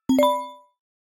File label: Contact, Samsung notifications; Galaxy J4 Core